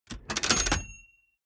mechanics small rattle bell

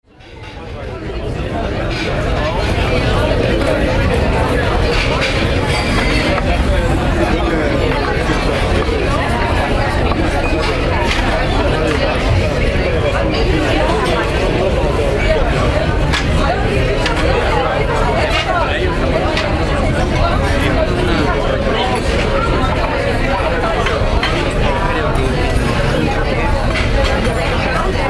restaurant miami beach

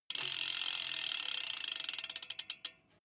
bmx bike freewheel push and slow down stop

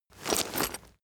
leather metal handling long

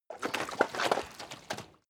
cow defecate

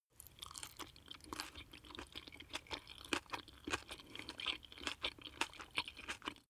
chew spaghetti medium

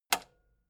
metal button spring click fast

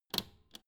old button hard press

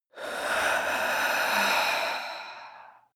female breath slow out

